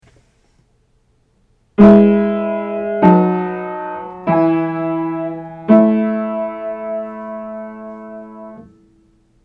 Part Writing 7th Chords Aural Training exercise

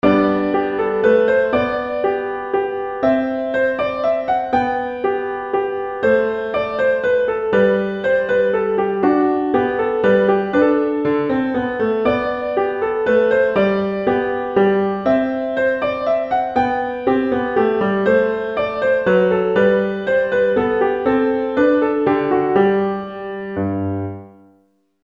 minuet in G; Bach

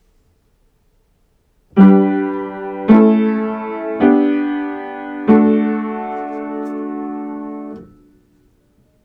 Second Inversion Triads; Part Writing; Aural Training exercise

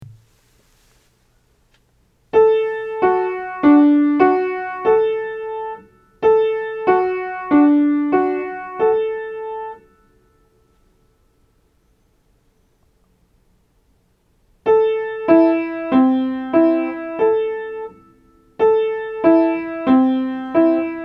Triads in Inversion Aural Training exercise

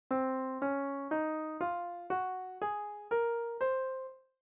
Locrean

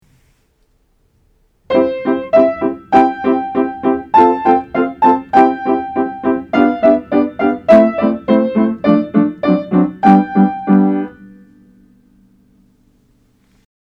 Harmonic Rhythm; Aural Training exercise

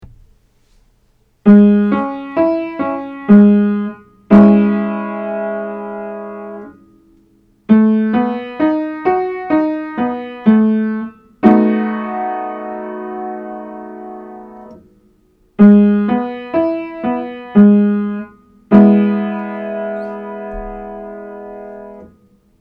Diatonic Harmony; Aural Training exercise

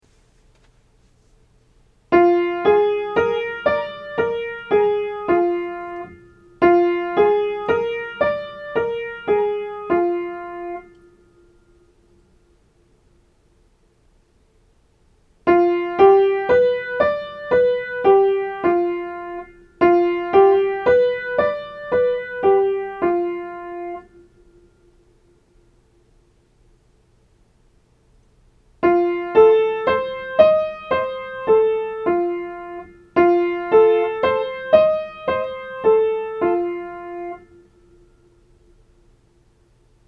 Major minor 7th Chords Aural Training exercise

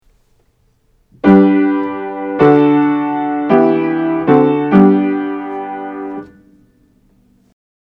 Root Position Triads; Harmonizing; Aural Training exercise

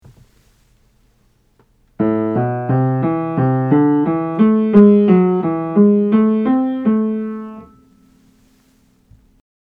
Minor Scales; Aural Training exercise

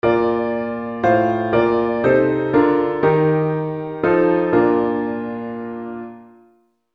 functional harmony

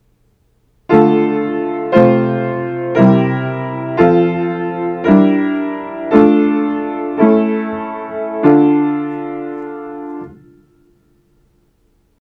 Harmonizing a Melody Aural Training exercise

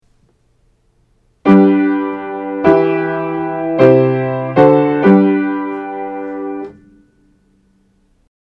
Root Position Triads; Harmonizing; Aural Training exercise

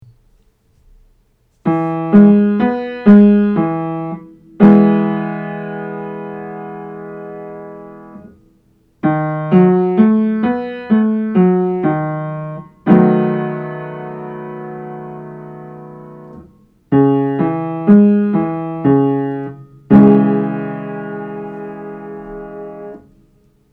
Diatonic Harmony; Aural Training exercise

